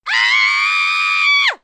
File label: scream; sniffles